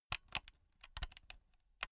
insert usb laptop wrong, elektronik; objekte

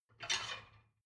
dishwasher plate grab

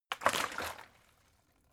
bottle water big shake single; berufe

